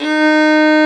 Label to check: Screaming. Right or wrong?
Wrong